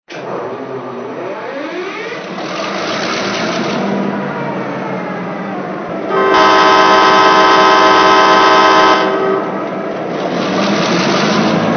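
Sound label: MP15AC, locomotive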